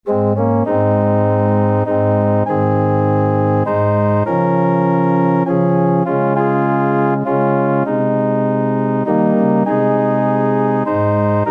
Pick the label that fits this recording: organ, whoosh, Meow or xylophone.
organ